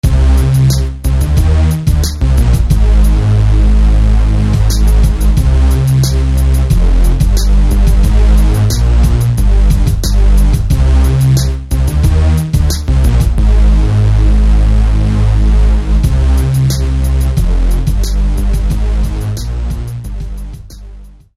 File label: Synth; Bassstorm; SFB FX